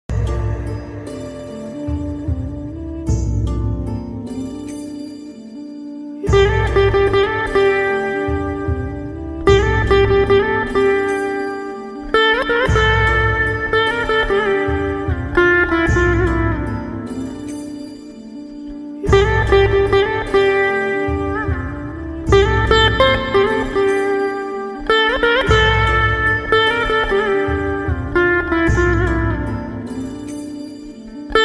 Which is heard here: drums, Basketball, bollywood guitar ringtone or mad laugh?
bollywood guitar ringtone